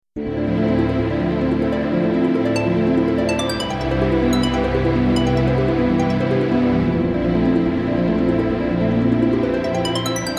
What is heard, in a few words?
dungeon; ダンジョン音楽A; 神秘・切ない・洞窟・暗い